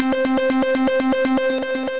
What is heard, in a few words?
Bright Plonks; Synth Rhythmic; Ableton; Factory Packs; Build and Drop